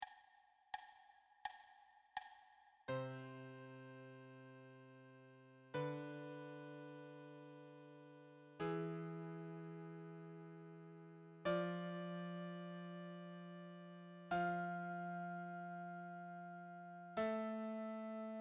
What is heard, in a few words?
wholenotes duet